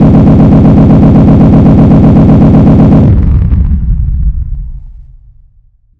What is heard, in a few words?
ningyou bomb